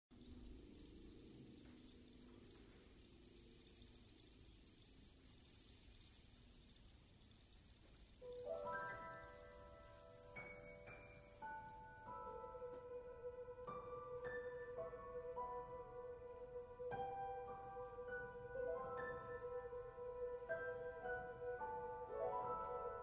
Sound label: Rainstorm